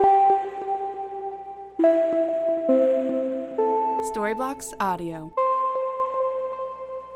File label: Ambient House Pad 134BPM A